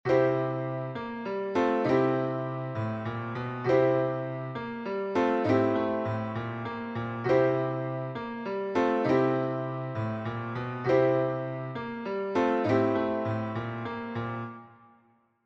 turn 12 8, Blues Piano; mesure 12/8; Transitions; Blues Licks

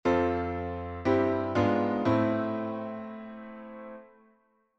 IV-bivdim-vi, gospel piano; Enchainements d'Accords

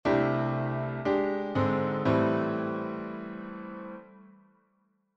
I - III - vi, gospel piano; Enchainements d'Accords

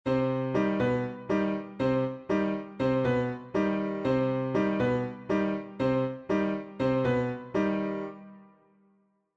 montuno double, latin jazz salsa

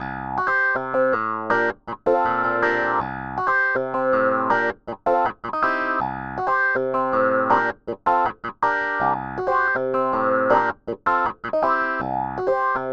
blues, Funk Blues, piano; Funk Blues 1; Second Line 2 + 3

